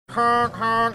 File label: making car honking sounds